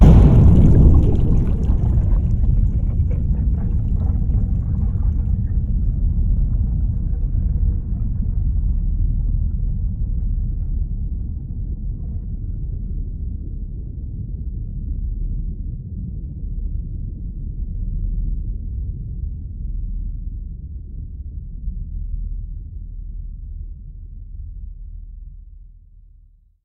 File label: crash; seaworld; Blind Drive